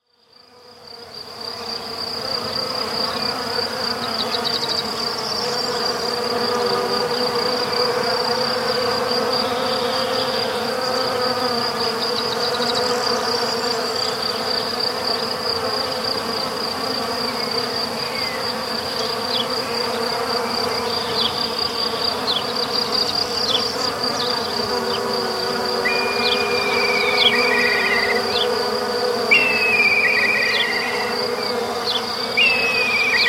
Coniferous woodland in late Spring, midday atmosphere with Black Kite, Tree Sparrows, Corn Bunting, Chaffinch, field crickets, bees, flies; distant Hoopoe; Outdoor Sound Effects; BBC